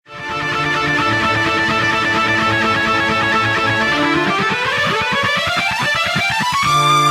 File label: Victory Music